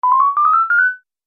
Multimedia Music

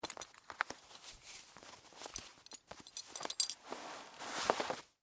Foley, Clothes, Belt, Take, Off; Dressing; Human; BlastWave

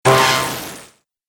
Alien Rifle Discharge

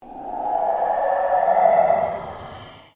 Ambient Pulse Ghost Chatter; Sound effects created by manipulating other sounds; Scary Soundscape; Scary Soundscapes

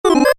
Computer Beep, Short; Multimedia; Stuart Duffield

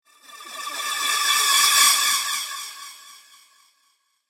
Scary Sci-Fi Swoosh, whoosh sound effect; science fiction sound effect; star wars / trek style games; Andrew Fleming

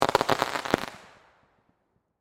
Firework Crackles, Fire, Firework, Crackles, Pops; Fireworks

